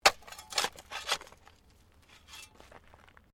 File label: Digging Shovel Mulch; Various digging tools and processes; Construction Sound Effects